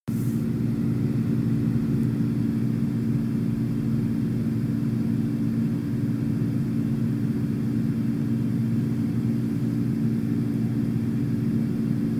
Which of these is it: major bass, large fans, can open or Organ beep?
large fans